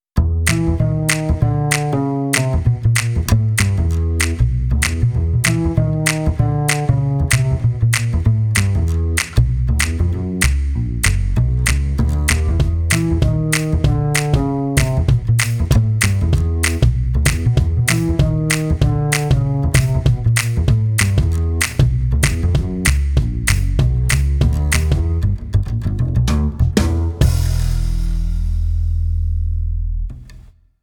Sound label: acoustic bass jazz podcast intro commercial